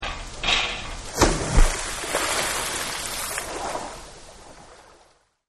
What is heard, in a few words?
Splash, Pool; Pools; Partners In Rhyme